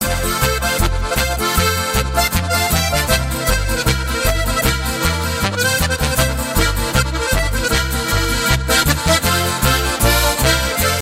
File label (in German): tiroler polka